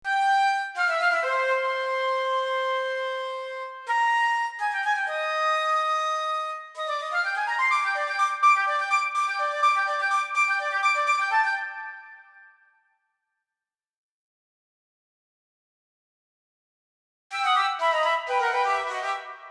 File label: Time Nest - Flute